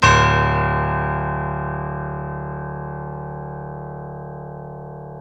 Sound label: PNO, Grand Pno; Club-50 - Foundations Roland; YPno 1 M